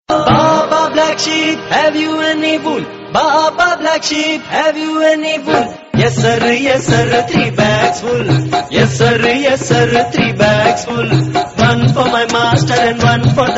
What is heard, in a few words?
Funny Rhyme